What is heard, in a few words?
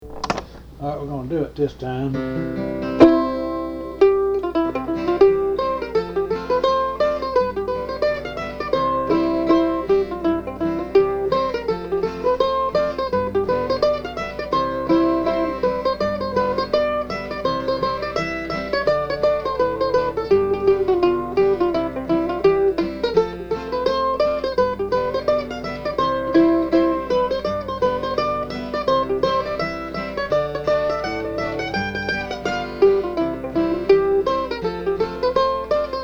Hornpipe; Prescott